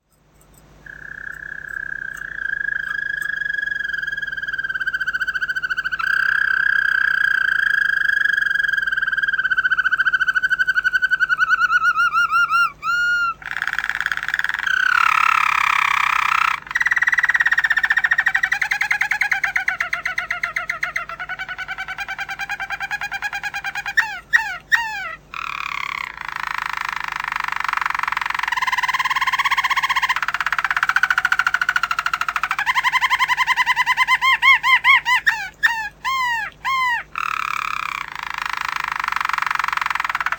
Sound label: roller